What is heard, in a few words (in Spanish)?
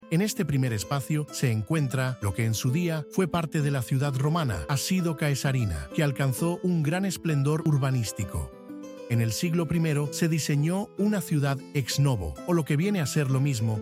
audioguia música